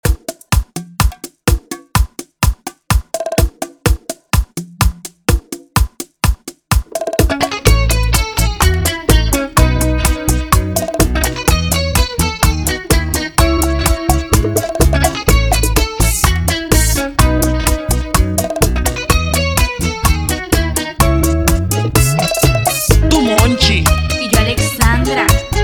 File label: intro outro 126bpm